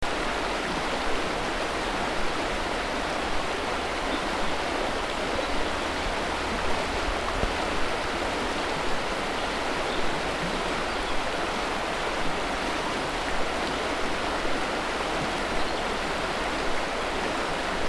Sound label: river